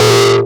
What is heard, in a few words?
Industrial Analog Single Notes Short